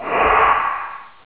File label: Possession Missile Launch; Warcraft 3